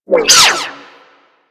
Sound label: Energy Projectile By; Corpus